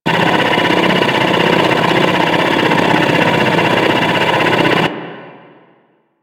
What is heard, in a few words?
Hub Extractor Drill Loop; Tenno; Warframe; Ambience